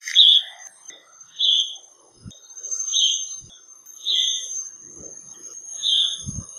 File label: Yellow-bellied Bulbul calls, Kledang Sayong Forest Reserve Ipoh Perak Malaysia